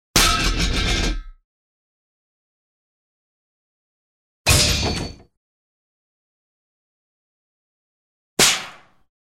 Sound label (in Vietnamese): Tong hop tieng kim loai roi xuong dat